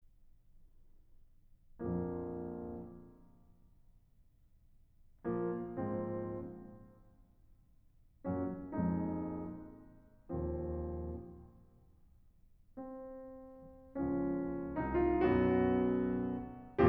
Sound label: Sonata for Trumpet and Piano, Trauermusik Sehr Langsam